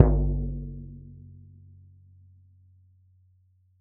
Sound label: Frame Drum Large; World Percussion; Cymatics; Emperor World Percussion